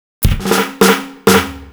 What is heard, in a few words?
Laidback Fill, Drums; Fills; Misc Fills